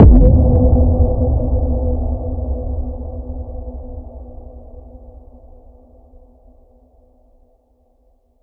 impact underground; Virtual Riot; Impacts

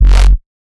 BASS SHOT wop

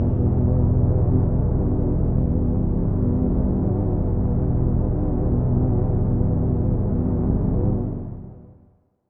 Pad C, Synth Prophet One Shots; Electronisounds

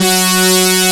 F#3, Analog Odyssey

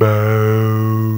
BAAOO; AMG

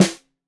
Snare 65 Reg Stereo, Deeply Sampled Drums

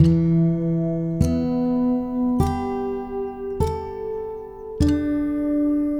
Guitar And Synth

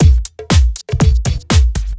4 floor beat, trance

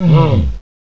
NATIVES; CMI Sound Library Rev 1.3

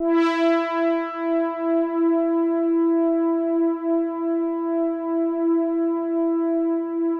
OBERHEIMS

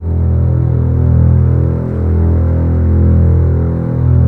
Basses E 1, String Ensembles; Miroslav Vitous; 90 s Sample CDs

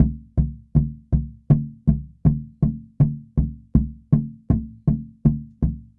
Pow Wow Drum West, Native American; Sonic Foundry (Sony Creative Software) - Continental Drift Aftershocks

